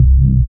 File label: SYN 202, MC-202; Sound & Vision - Gigapack I CD 2